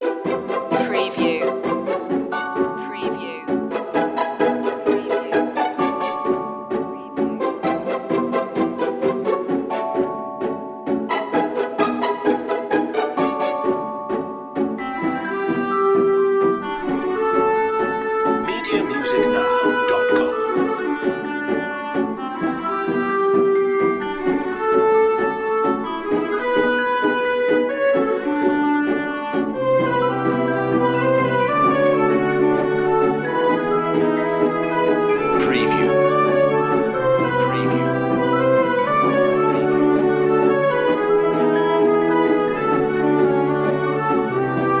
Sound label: no bass perc